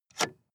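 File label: sports car interior ventilation adjust hard; verkehr transport; autos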